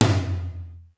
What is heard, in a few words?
lo flat hl; sr16 tom